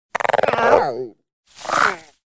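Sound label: Event Garden Frog Jumps In Pond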